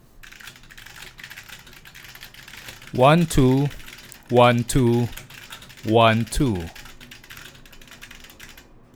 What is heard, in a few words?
no noise removal; graphics card